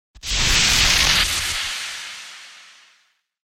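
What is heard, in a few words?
Compressor air out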